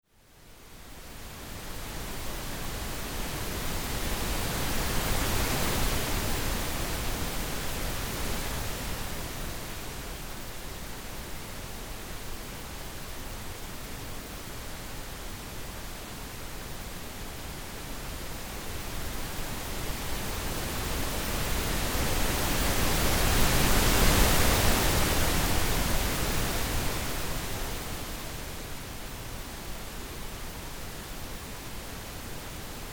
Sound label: ocean waves